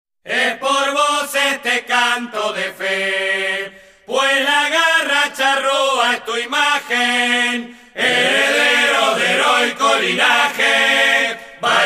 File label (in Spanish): himno; hinchada